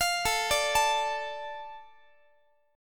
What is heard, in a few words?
A Suspended 4th Sharp 5th down Guitar; Asus4#5; arpeggiated